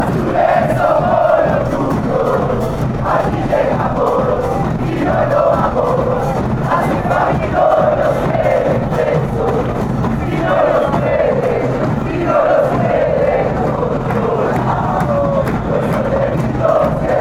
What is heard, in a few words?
blues we are tough ones, chant